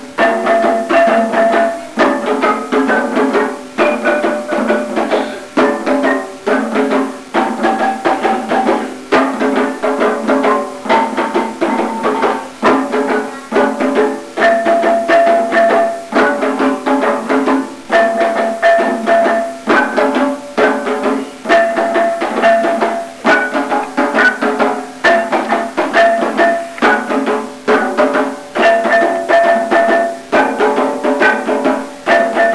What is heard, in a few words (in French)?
percus